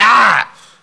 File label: ah